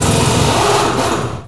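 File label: Impact big, air tools, industrial; Air toolks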